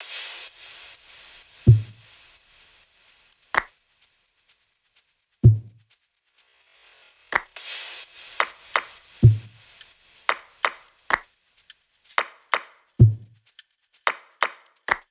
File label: loop perc 127; Seismic Loops